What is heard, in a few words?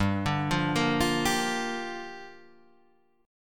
G Minor 7th, Guitar, Half Step Down